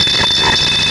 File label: Static; Blastwave